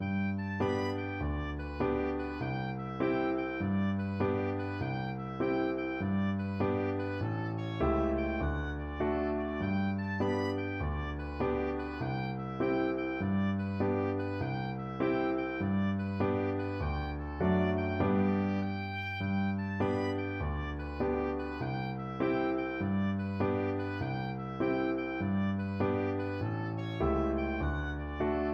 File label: oboe